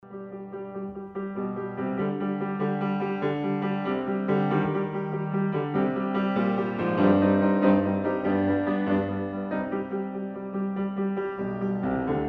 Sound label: Schubert Impromptu Op 90 No 1 in C Minor